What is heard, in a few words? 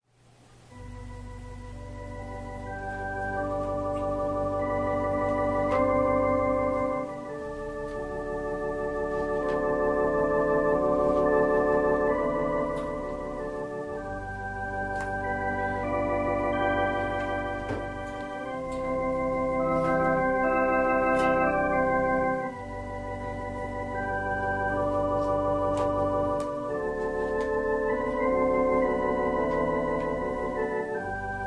Organ and violin prelude